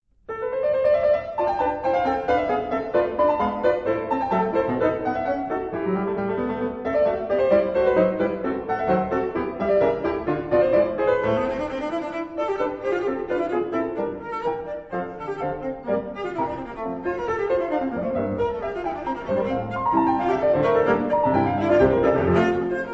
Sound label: Allegro, Cello; chambre; CtoInacheveCello